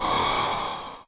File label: breath; minecraft